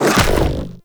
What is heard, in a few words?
Hoverboard Jump; Vehicles; Warframe; Venus Hoverboard